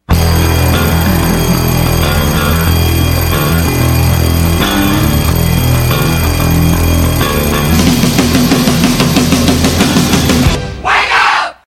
wake up rock

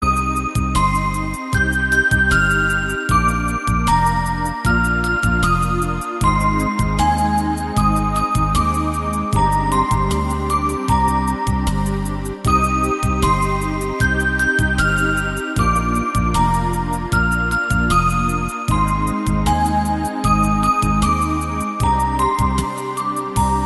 romantic music

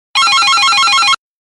business call

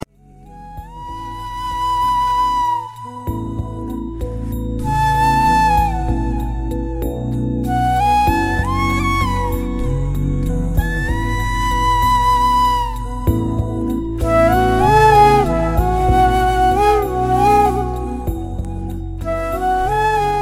sad tone

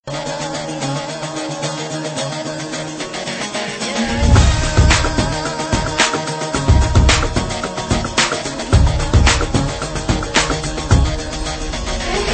french string

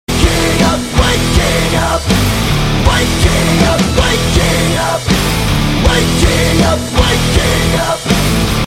alarm rock

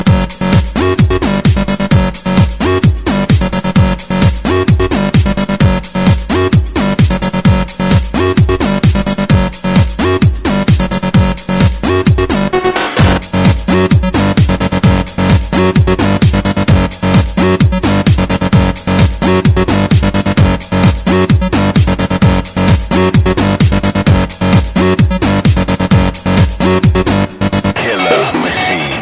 drums

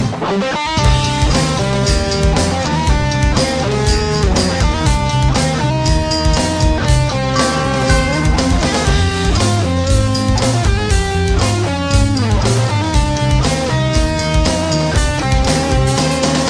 guitar theme; x-men

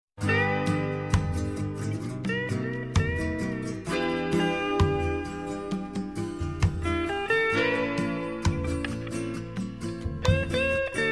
guitar solo

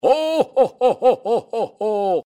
santa laughing

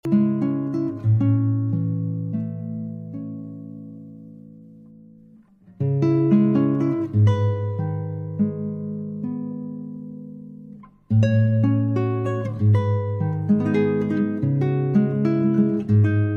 relaxing melody

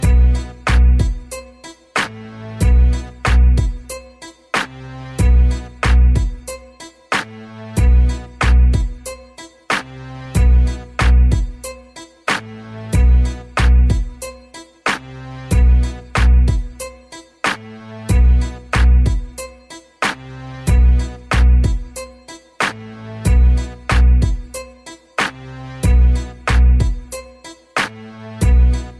hip hop

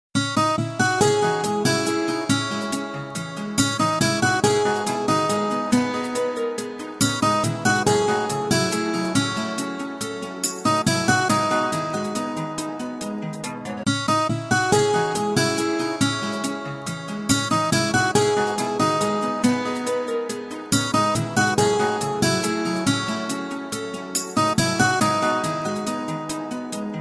romanian guitar

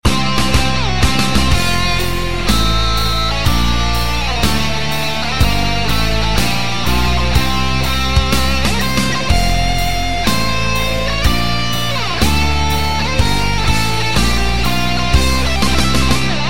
anthem rock version; peru

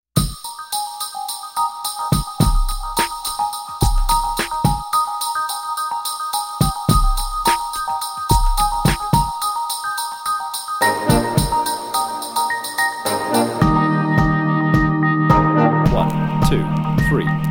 cathedral brass joy to the world

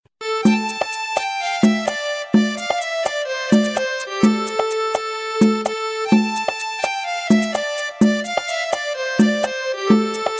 lamba funk

